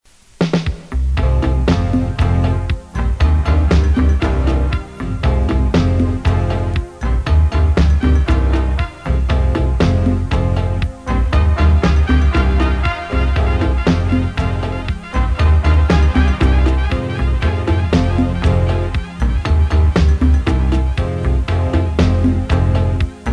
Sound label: talking drum dub